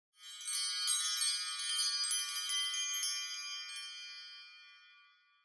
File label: Smartphone Alarm Dry